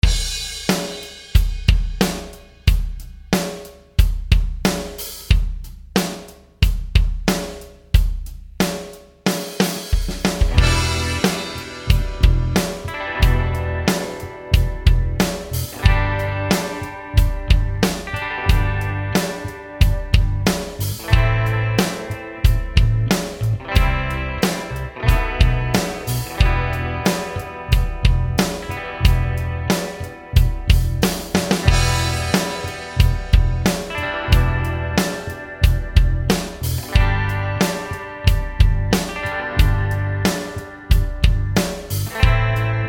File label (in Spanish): pista de acompañamiento; como tocar con pua